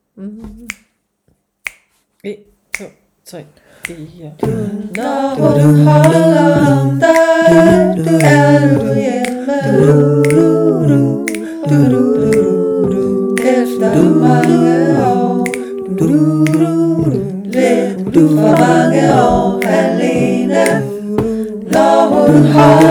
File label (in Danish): TUTTI arrangement